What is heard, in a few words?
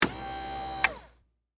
Servo Motor Short, Hospital, Bed; BlastWave